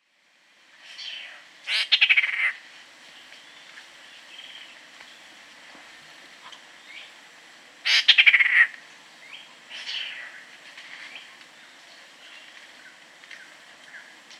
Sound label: Squirrel Cuckoo (Piaya cayana), Peru, Ecuador; S. America